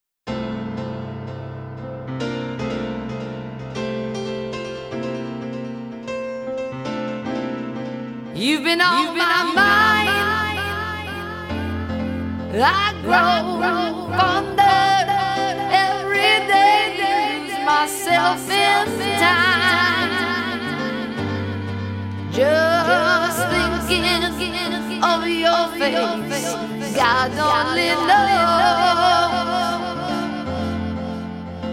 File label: music echo